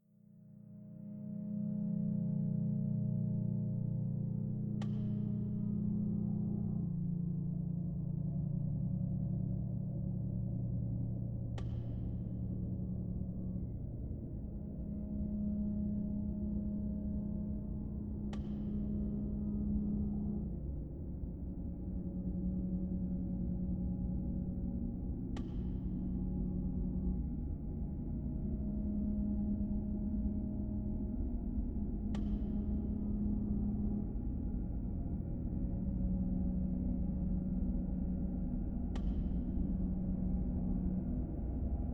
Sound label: ambience mansion perc; Immure